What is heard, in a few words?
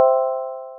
Bell; unity